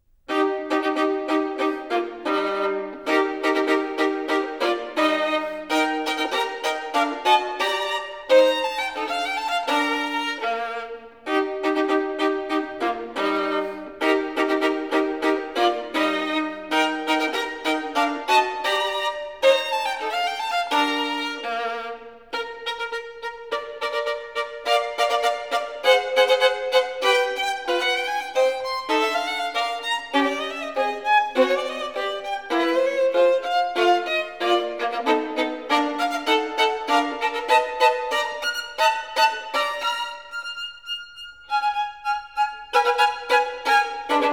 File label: Caprice for Solo Violin, op. 1 no. 14 in E-flat major; Accardo; Paganini